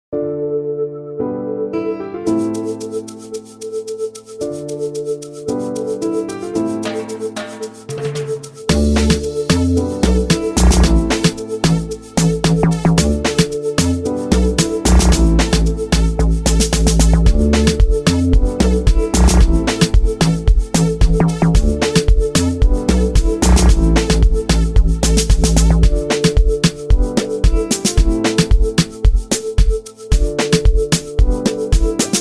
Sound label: amapiano music africansong; kenya; amapianodance